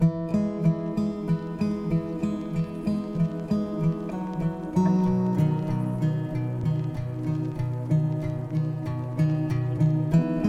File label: instrumental lofi